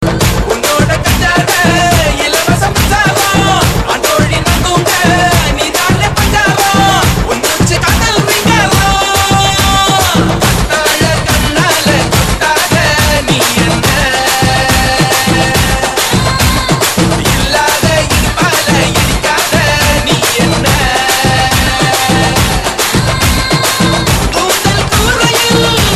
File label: Tamil Remix